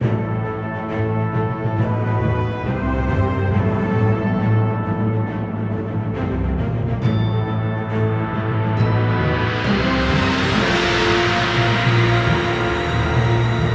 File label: music quiet theme; lex corp